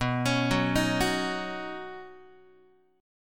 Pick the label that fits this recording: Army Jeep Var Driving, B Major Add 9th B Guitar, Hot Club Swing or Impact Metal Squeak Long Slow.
B Major Add 9th B Guitar